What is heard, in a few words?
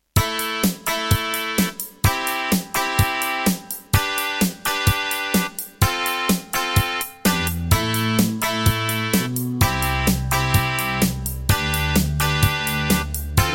Terratec MiniWaveSystem WT64; raptor call of the shadows